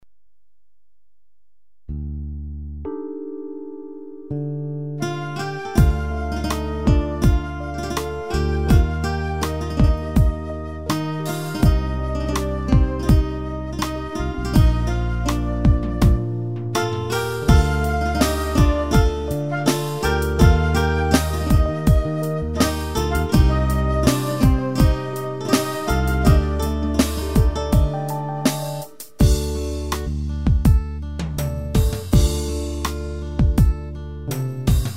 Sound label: slow; ketron ritim